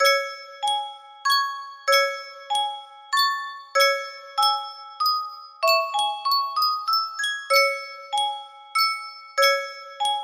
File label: sankyo music box